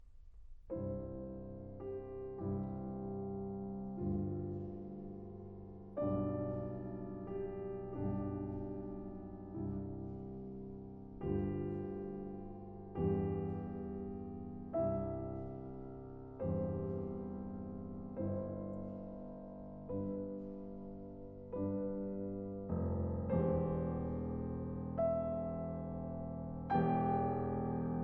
Piano Sonata in C Minor, Arietta, Adagio molto semplice; Beethoven Works For Piano